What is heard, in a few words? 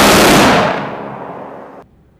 phalanx stop; emplacement